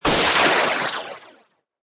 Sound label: Magical Whoosh Filter Flange: Magic sound of casting spell; Magic sound of casting spell, conjuring or wizardry. Witchcraft ritual summoning elemental or; Lynne Music